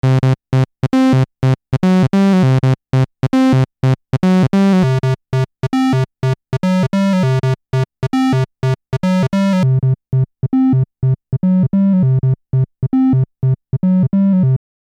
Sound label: Korg EA 1 Waveforms Saw Pulse Tri